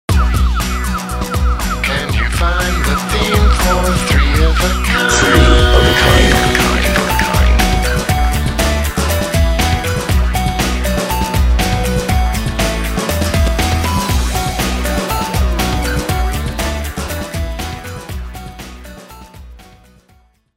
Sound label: intro, Electro